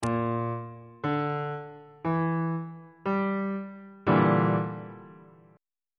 f double sharp minor 6th 1st inversion on bass clef